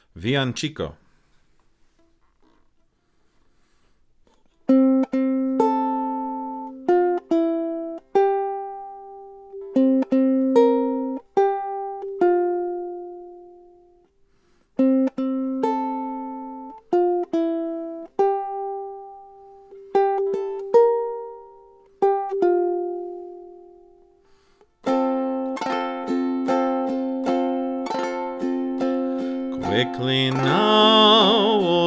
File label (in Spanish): Villancico, ukulele